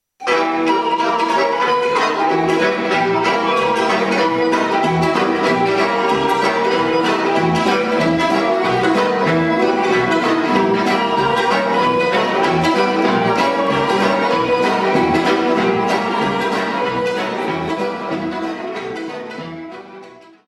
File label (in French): Boiereasca, Roumanie